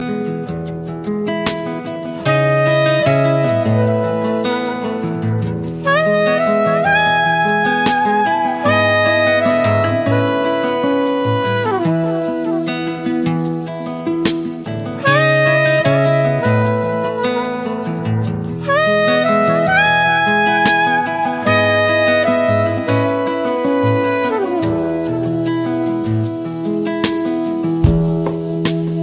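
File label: ringtone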